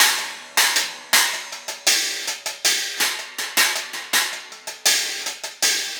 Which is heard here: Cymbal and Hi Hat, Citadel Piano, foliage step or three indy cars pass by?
Cymbal and Hi Hat